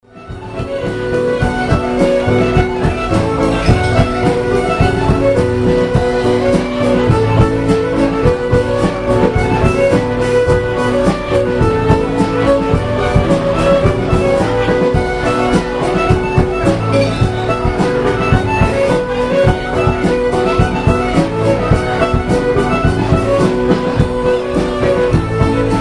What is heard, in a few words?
Virginia Reel